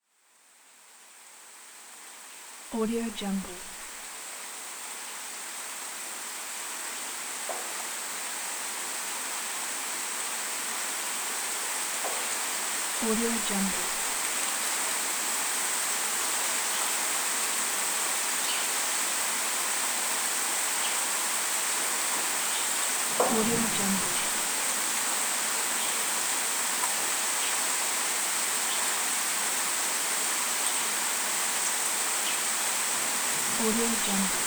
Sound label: soft rain; tineside